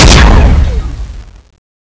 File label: charger